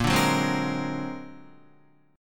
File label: Bb Augmented 9th Bb, Guitar; Bb+9 strummed; JGuitar